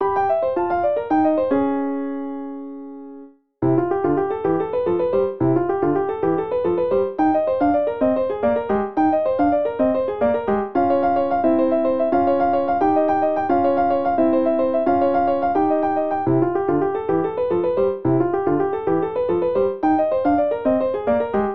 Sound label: Piano; The Legend of Zelda Ocarina of Time